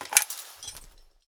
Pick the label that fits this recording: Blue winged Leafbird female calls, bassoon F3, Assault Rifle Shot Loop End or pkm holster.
pkm holster